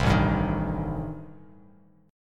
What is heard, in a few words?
A-Minor 7th Flat 5th, Bass Standard, chord; 8,0,5,8; Am7b5 strummed; JGuitar